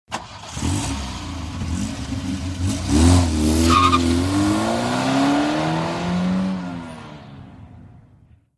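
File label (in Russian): car sound; zvuki transporta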